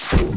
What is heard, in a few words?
deconnect; zrush